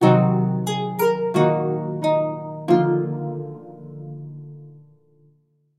jingle

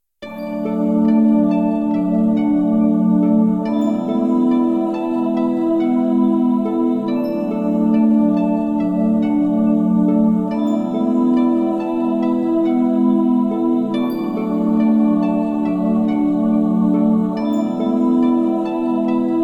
amb loop

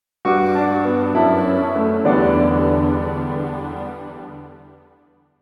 jingle

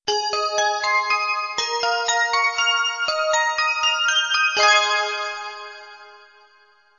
soft

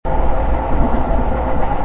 train, natural

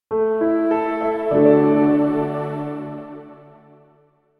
jingle

